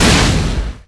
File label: explo; sura